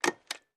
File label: Stapler Staples